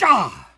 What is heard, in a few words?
male damage